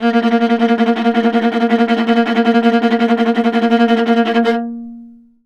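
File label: VIOLA tremolo A#2; Orchestral; DirectWave; Varazdin Orchestral; Viola II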